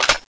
reload, weapons; tfa cso

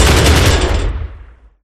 pendulumturret fire player; gow

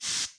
spray

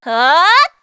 shout long; macha

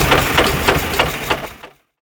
generator stop

